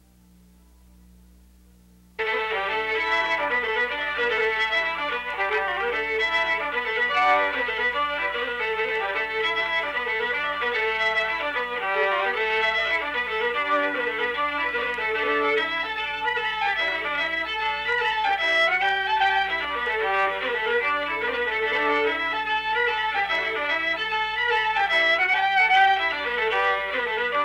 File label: Polka; Ballydesmond Polka